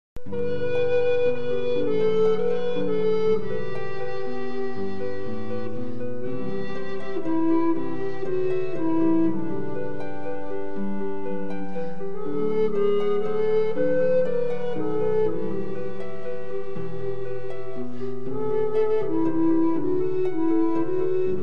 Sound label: snow falls soft the night for flute harp; boissy dominique